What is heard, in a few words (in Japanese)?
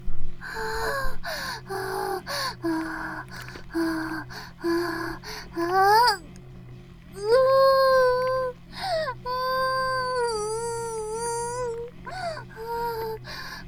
バイブ放置; イクたびにわけて